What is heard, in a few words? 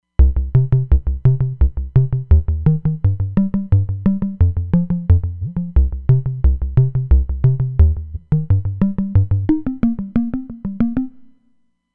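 Square Pressure, Roland Fantom XR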